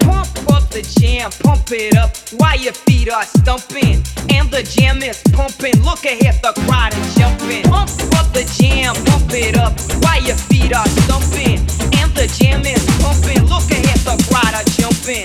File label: deflated emote music; left4dead2; kodua; fortnite emotes